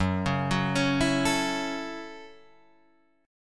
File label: F sharp Minor 7th F sharp, Guitar DADGAD